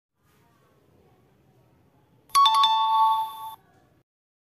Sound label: correct sound effect